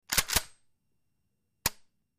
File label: Big Shotgun Pump Dry; mvmt